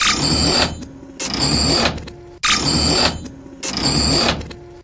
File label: windup reverse whoosh; Electro and Synthetic